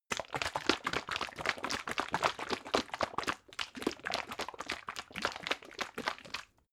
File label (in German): bottle syrup shake slow, gastronomie